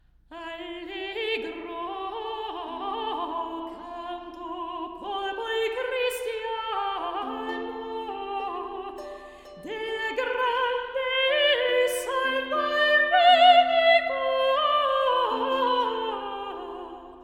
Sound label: Allegro Canto Lauda Saltarello